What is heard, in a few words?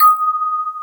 Electric Pianos; Wizoo Electric Pianos